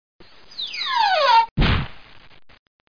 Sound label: slide down hit; DR BRAIN; BUGS